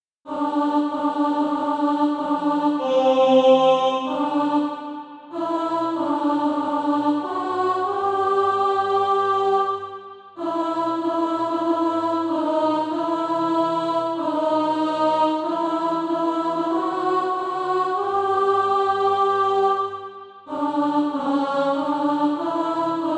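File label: alt, Practise Music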